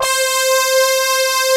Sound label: EDGE SAW; Pro-Rec - Synthsonic; TRINITY